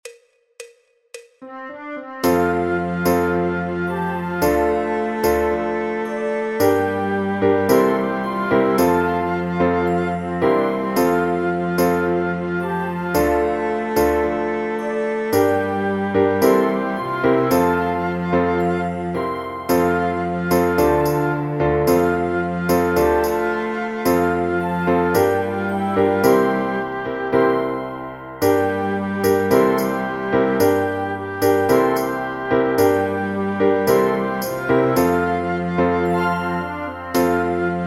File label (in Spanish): en FaM, Flautas Violin Oboe